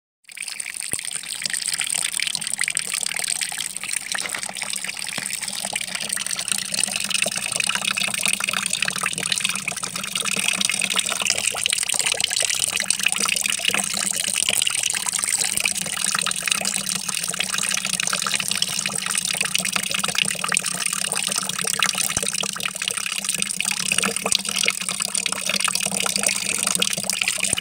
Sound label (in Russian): voda lietsya v vedro